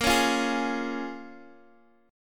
A sharp Minor 7th, A sharp, down, Guitar, Open D

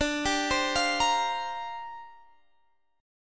C 7th Suspended 2nd Suspended 4th D

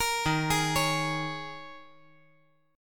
D sharp 7th Suspended 4th

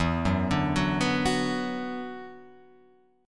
E 7th Suspended 2nd, down, Guitar, Open F; E7sus2; arpeggiated

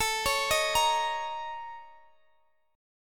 Bb Minor Major 7th Double Flat 5th

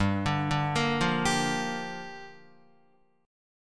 G Minor 7th, Guitar; Gm7 arpeggiated; JGuitar

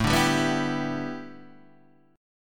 A 7th Suspended 2nd Suspended 4th Guitar Open D

